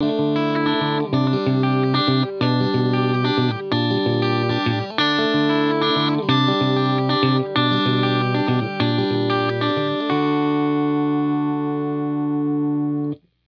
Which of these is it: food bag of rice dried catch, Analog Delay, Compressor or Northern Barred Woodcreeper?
Compressor